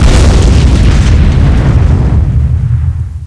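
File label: large, impact explosion; SINDEMO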